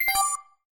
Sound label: Sys Set pop up